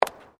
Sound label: FOOTSTEPS WOMENS HIGH HEELS PAVERS STEP; Footstep Foley; Footsteps (Sound Effects:Human)